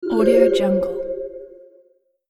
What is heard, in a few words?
subtle and magical aura